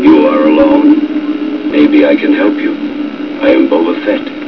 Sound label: death; quake2; bobafett